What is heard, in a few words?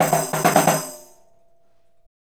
Tambourine